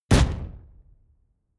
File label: sci-fi shot rustle